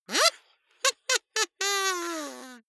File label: kazoo laughter